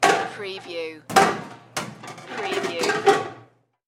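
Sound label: Kitchen Pots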